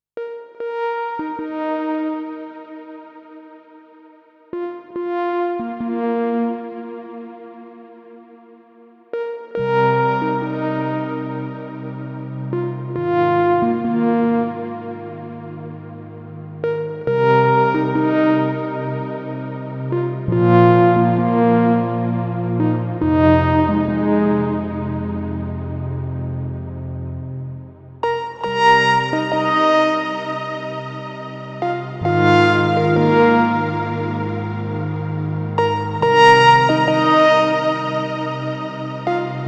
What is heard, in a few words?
lead; C15 demo; Evangelos Lead